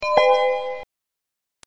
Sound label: Notifications